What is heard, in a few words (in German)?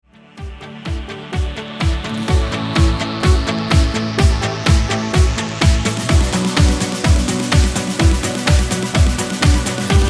ohne Chor